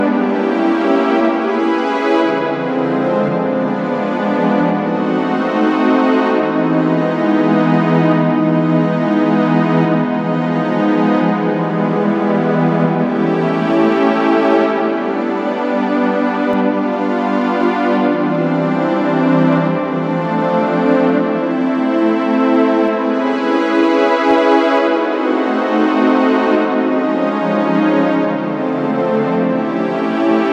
strings

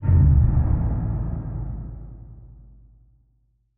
arcane activate

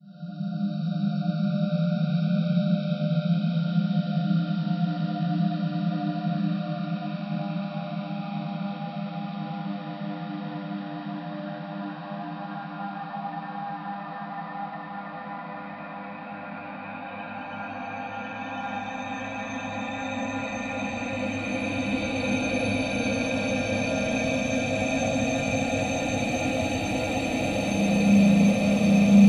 soundscapes and drones

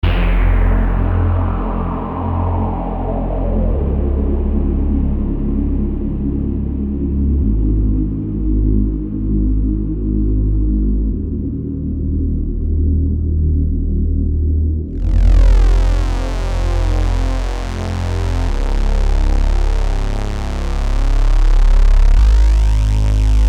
bass

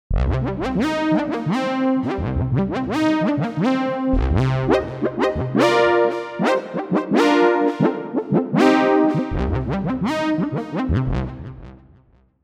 Matrix 6 broad brass; opx pro3

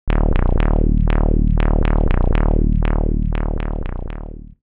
deep bass pattern

